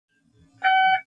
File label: Leave, Train; OpenBVE